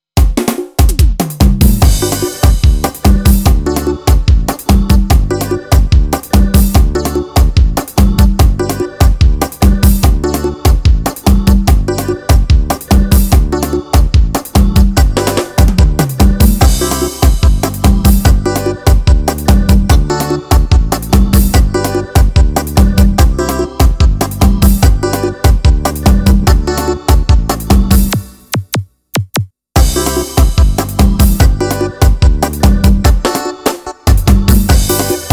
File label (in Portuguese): arrocha swingueira